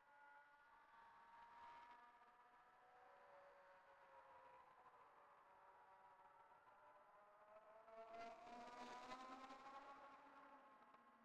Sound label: going at 90 kmph, trains; ns 200